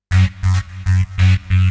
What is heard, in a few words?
ZIPPER, KEYBOARD, HOUS TEC; MAGIX